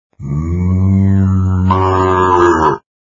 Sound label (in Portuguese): Vinheta boi